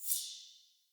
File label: UI XP Tick